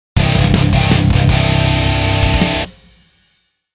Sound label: rock